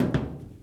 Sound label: step metal soft; OneShot Fading Memory